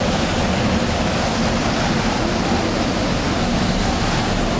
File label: Wind Rushing Loop; Lucius III; pc linux